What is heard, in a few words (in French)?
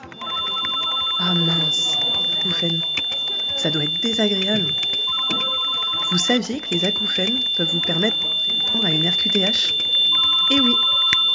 audio acouphenes; Klesia